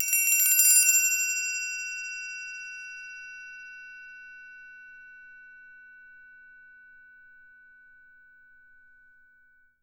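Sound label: SM TRI right, percussion; Miroslav Vitous - Percussion & Harp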